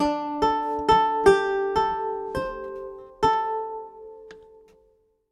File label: Lute Performance; Lute Anim Performance 06